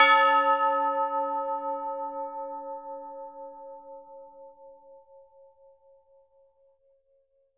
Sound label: tubular bells hit A4; AO bell